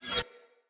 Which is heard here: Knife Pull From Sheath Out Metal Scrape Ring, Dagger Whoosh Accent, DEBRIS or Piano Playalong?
Dagger Whoosh Accent